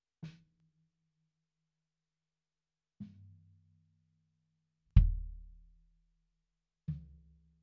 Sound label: BD In